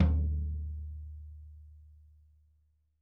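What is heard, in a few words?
floor tom; 90s sample CD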